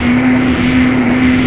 rainbow beam hold; Gachitale

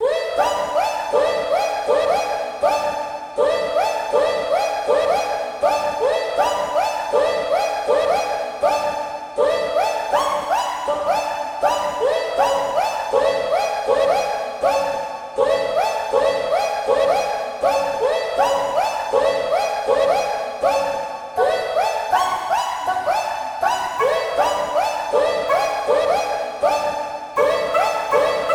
music box; Gachitale

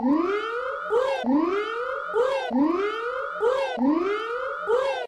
alarm; Gachitale

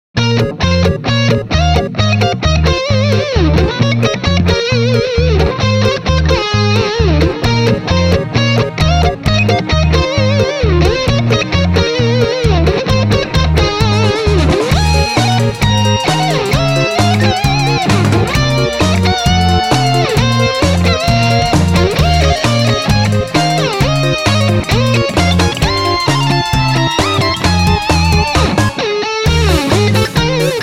guitar; Mario Paint

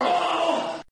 fall; Gachitale

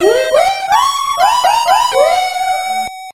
ball chime; Gachitale

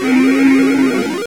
computer; Gachitale

